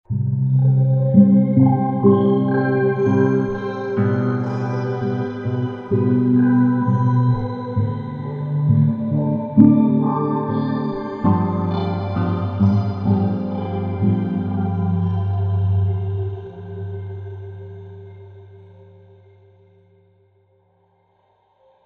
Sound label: Magic Pad; EFX Motions